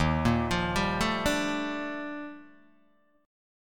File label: D sharp Major Sharp 11th, Guitar, Open D